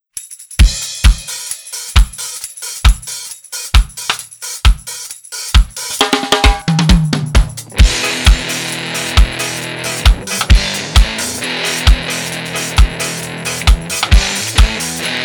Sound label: Reggae Rock